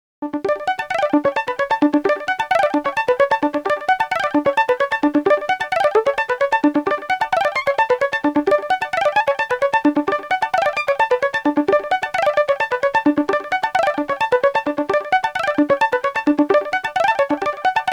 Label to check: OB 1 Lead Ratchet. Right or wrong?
Right